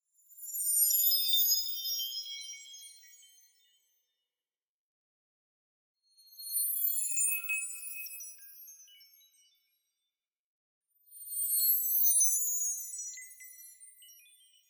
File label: Atonal Crystal Chime Transition Short